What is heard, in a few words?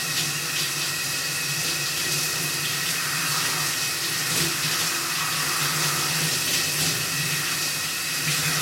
shower